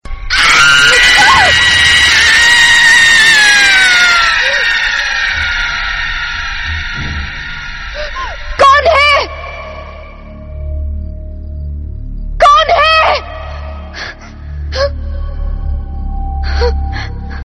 horror ringtone; raaz

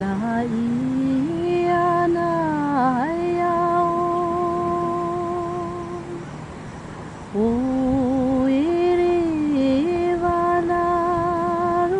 taiwanese aboriginal cool ringtone

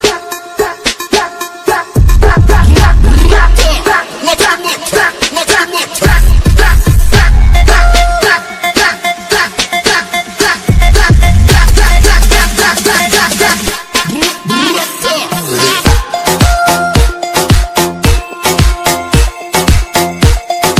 argentina song ringtone

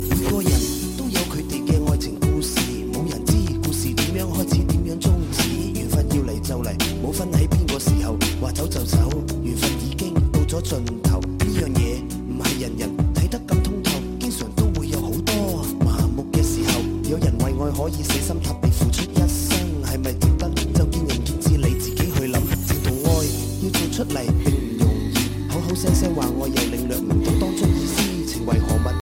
chinese rap

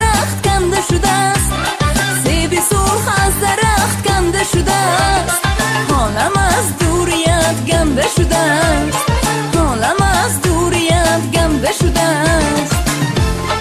tajik song

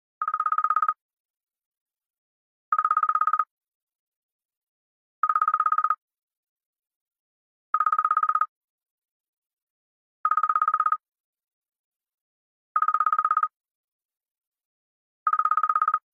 apple iphone squirrel ringtone

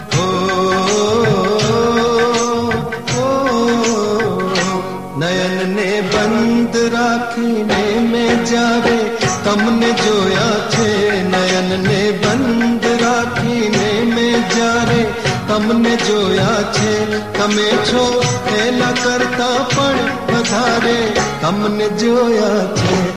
gujarati gazal

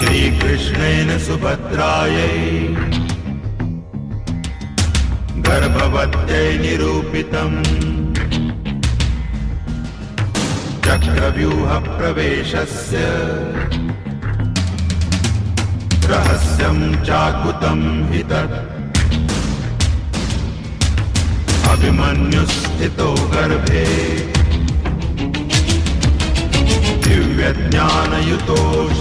theme ringtone; vaastav

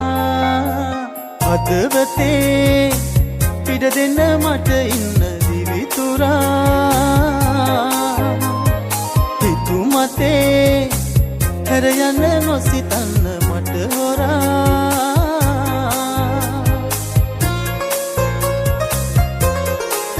sri lankan song ringtone

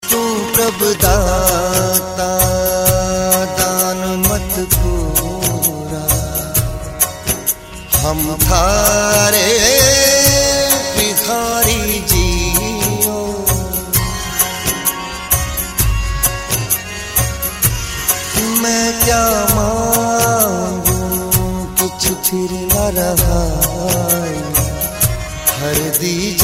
sabad gurban ringtone

